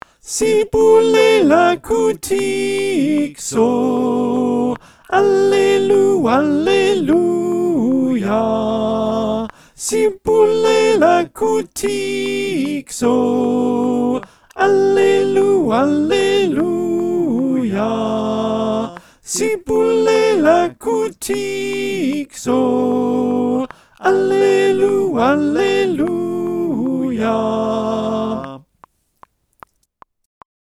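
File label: alto, choir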